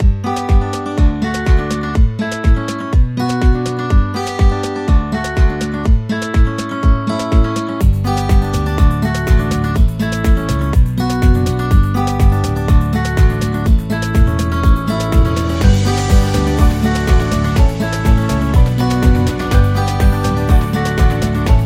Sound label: Kid School, ukulele